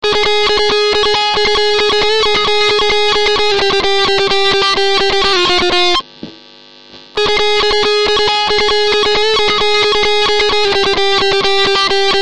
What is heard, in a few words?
trance